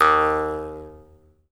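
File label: Jaw Harps, Pitched Percussion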